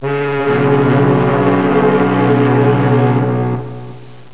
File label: ship horn